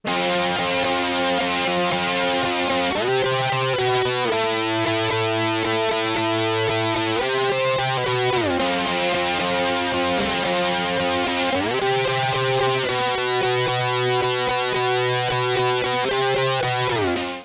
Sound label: elec gtr rhythm